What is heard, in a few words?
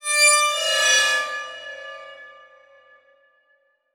Title Transition Bell; Adobe; Production Element